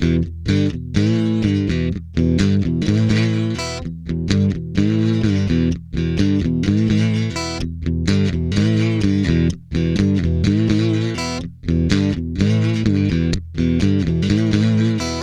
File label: Guitar Licks